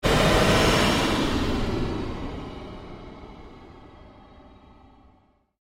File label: Created Pulse Long Fade, Rise And Dive Sound Effects; Sound effects created by manipulating other sounds; Rises and Dives